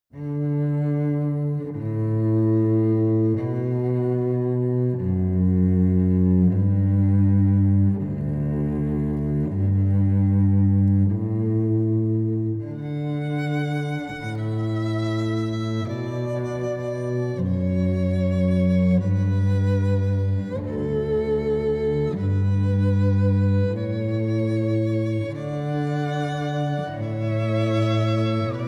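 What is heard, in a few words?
Harp; Classical Quartet; Kanon Pachelbel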